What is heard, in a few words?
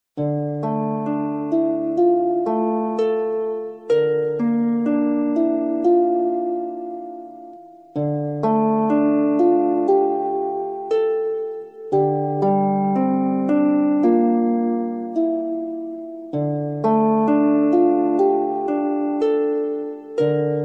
Spa Relaxing; spa relaxing music cd